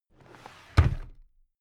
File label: drawer cabinet carton close heavy